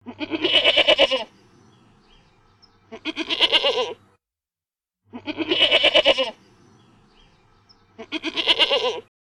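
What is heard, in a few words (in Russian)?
козёл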